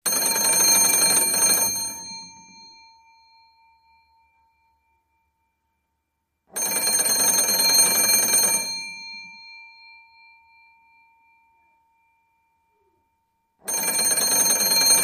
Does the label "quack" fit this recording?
No